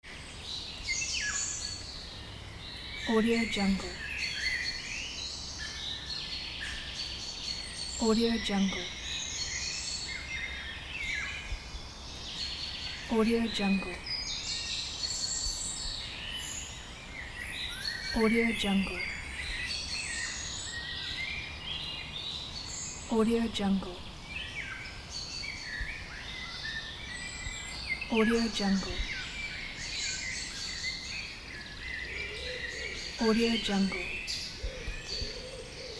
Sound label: forest birds